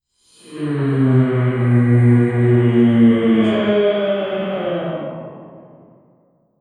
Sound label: silence